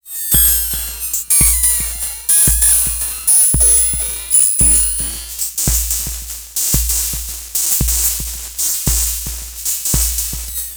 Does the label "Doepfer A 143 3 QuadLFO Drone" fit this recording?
Yes